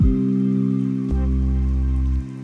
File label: Resonant Pads; BB 2HTC Samples Vol 4